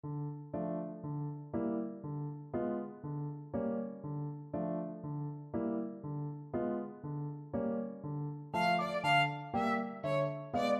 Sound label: sugar plum, violin